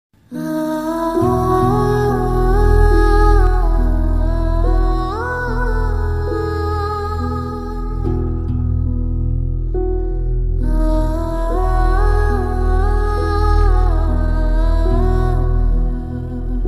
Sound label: sad ringtone tune; dear comrade